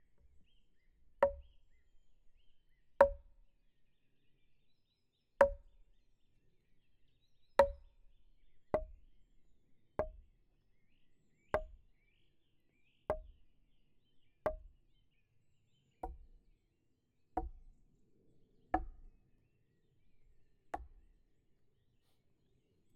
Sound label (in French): Gourdin sur buches proches serie x13; Percu Bois